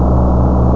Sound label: ENGINE; Blood Demo; PC Gamer IT CD 35